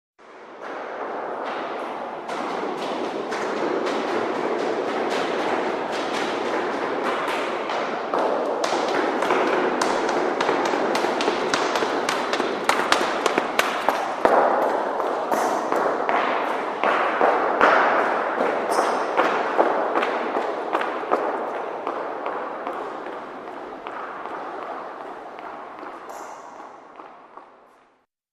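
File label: Footsteps By Echoey Stairwell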